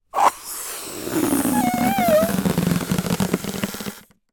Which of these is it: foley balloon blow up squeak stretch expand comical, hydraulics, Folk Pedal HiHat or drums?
foley balloon blow up squeak stretch expand comical